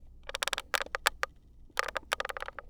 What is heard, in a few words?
Grinding plastic bending tension squeaking creaking under pressure; Matt Script; Squeaks & Creaks